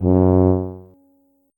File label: tuba note